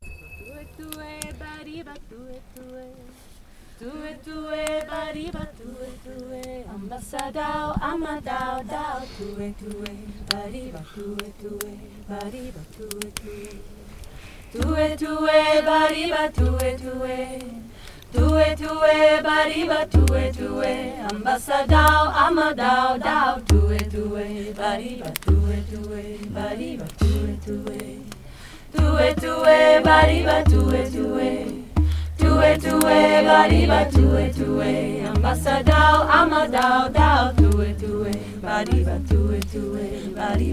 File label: beautiful chant; california 2004